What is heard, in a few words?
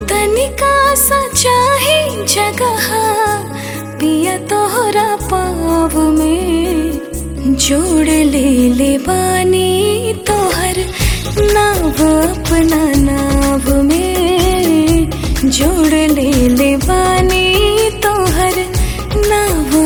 Ringtone